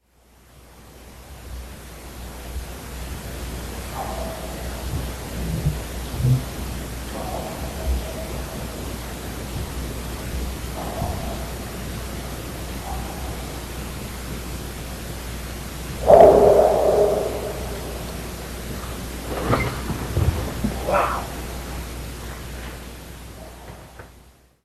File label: Squatch Roar; Sasquatch Roar